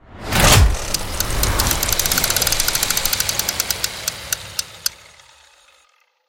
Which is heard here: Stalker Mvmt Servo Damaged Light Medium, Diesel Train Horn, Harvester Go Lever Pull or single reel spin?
single reel spin